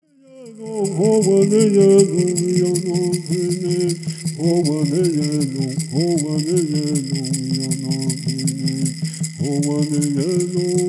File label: Peyote Song